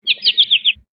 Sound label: Amb Animals; Red Orchestra Ostfront 41-45